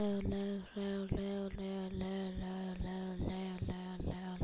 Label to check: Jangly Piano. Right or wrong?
Wrong